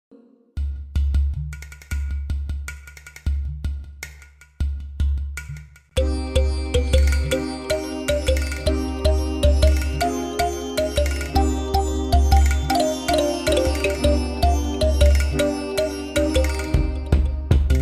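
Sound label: Song, Persian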